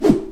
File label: Whoosch Sound